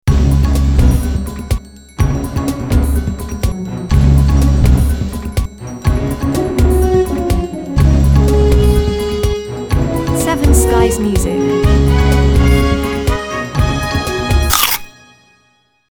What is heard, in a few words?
Urgent News